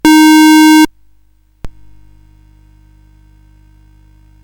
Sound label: Dragonworld sid CSG8580R5